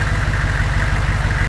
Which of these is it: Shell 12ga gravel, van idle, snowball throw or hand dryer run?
van idle